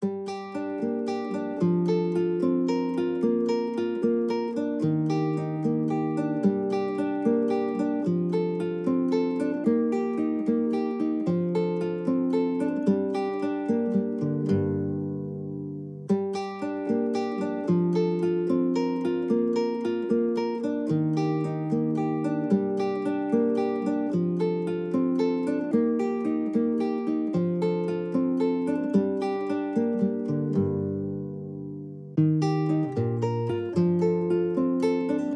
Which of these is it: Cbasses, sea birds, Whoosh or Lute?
Lute